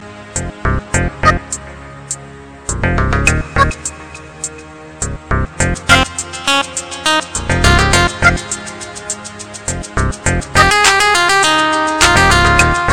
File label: Upbeat Tempo